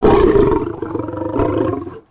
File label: Pet Lion Roar; creatures pets